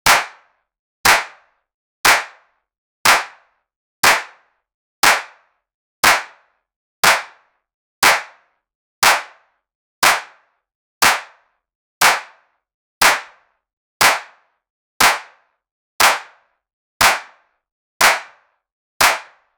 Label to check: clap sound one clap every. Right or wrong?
Right